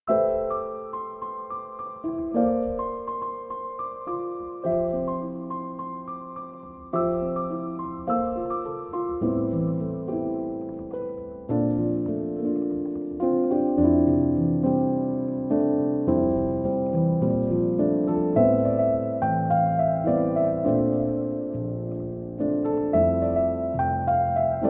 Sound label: Piano Instrumental